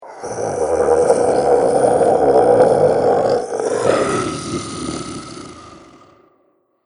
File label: The Dreaming Dragon, monster sound effect; Monster Sound Effects; Andrew Fleming